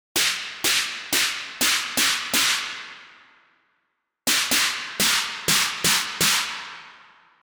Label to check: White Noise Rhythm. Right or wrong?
Right